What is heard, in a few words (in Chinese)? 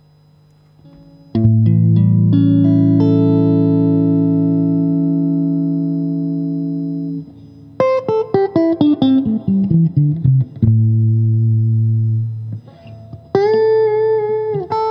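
60S OCC 单晶铜 清音 2档, acoustic guitar; kindman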